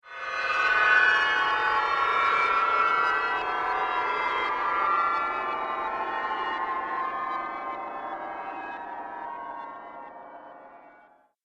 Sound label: Haunted Christmas Spell, Magic Sound Effects